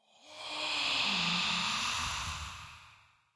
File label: cemetery, desert